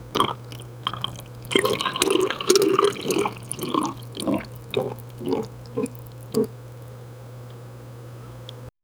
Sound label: drinking so much water